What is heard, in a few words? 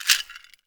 CAXIXI, AFRICAN PERC; Greytsounds VOL-1